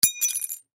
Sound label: Impact Broken Glass Drop; Drops; Sound Effects:Objects; Blastwave